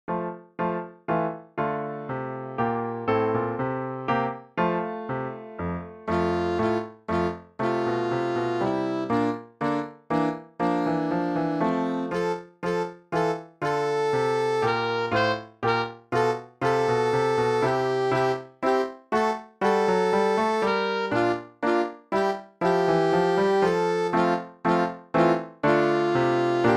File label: Alto Sax; Grade 9 Solos